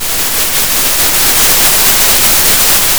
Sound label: pop, Sound of a thunderstorm